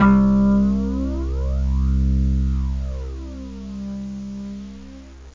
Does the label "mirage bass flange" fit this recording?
Yes